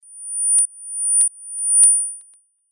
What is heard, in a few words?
wake up tones; oringz pack nine